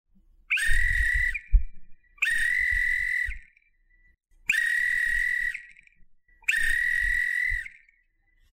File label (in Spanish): silbato evaluacion; silbato de emergencias